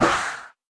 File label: Blow Off; The Denpa Men; Android